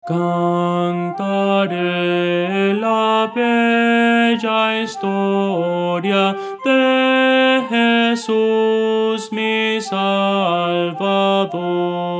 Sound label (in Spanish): soprano